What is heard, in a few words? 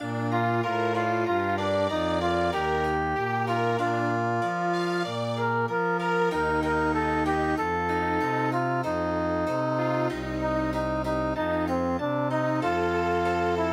Chamber Music, midi; My Indonesia